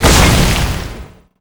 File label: Punish Totem Blow; Summoner